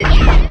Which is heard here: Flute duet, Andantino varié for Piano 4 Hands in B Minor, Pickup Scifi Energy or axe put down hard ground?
Pickup Scifi Energy